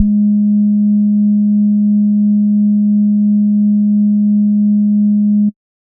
SBASS G#2, Sub Bass Sine; neuro; Stanza

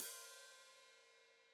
Hat Foot Open, Hi-Hat; Deeply Sampled Drums

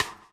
DOWN, DOWN KIT 78

